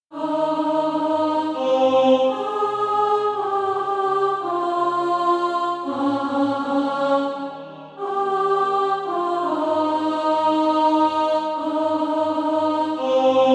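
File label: soprano, Practise Music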